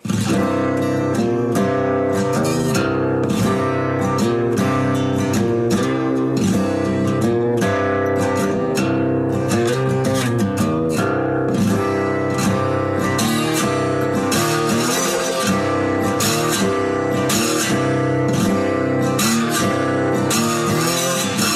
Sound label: music gtr